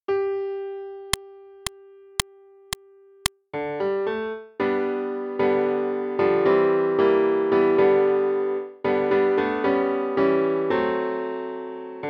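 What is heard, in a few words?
Bass Accompaniment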